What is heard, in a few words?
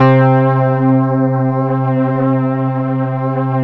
SYN, Analog; Sound & Vision; Gigapack I CD 2